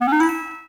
Pickup

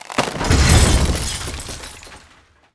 collapse floor large

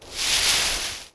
grass noise; people; Pirates Of The Caribbean

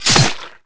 sword cut body; Pirates Of The Caribbean; DUEL

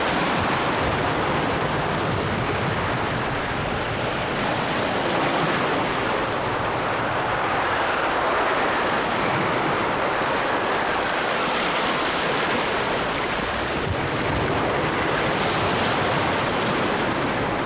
water sea storm; Pirates Of The Caribbean

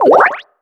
CUT; Puyo Pop Fever

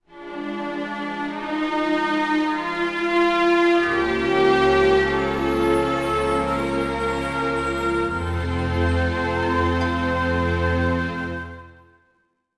romance; Star Wars Knights Of The Old Republic II; XBOX

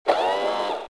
walk, protocol

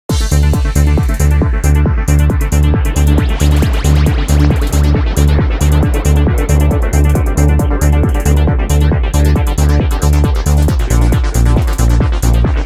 Pinball, Music; Pure Pinball

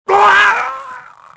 death; Star Wars Jedi Knight II Jedi Outcast; XBOX; jeditrainer

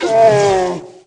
Gado Pain, Aliens

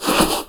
clothes; people; Pirates Of The Caribbean

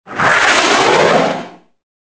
missile fly; Soldier Of Fortune II Double Helix; XBOX

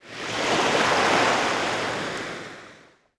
wave; Tony Hawk's Project 8; XBOX

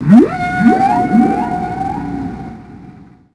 Whale Call; Finding Nemo; XBOX

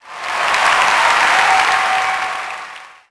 POS Reaction,Crowd; Cars; XBOX

